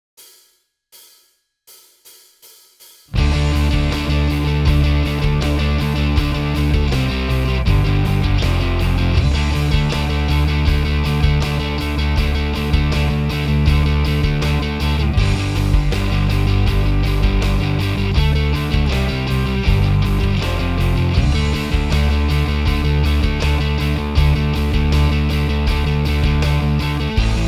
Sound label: backing track